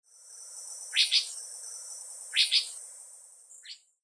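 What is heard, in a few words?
higegabi, Bearded Reedling; kochi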